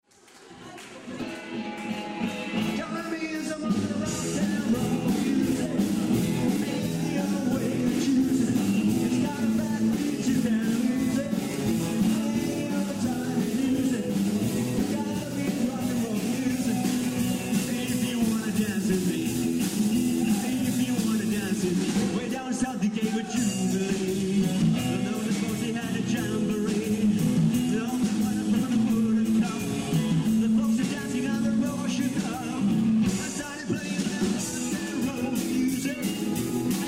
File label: Rock and roll music